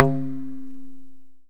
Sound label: PK, Indian Percussion Series Tabla; Mango Loops